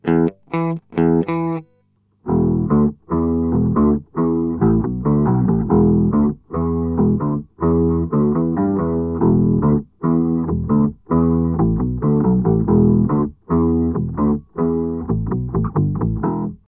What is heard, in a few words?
EHX Pico POG Sub Octave